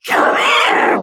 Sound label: Hit